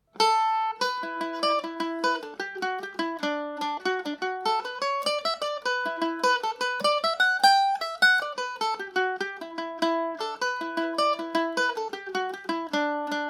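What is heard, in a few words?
E dorian g and o, Irish mandolin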